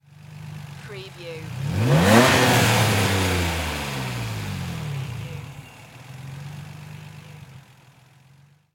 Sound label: NISSAN 350Z ENGINE SINGLE REV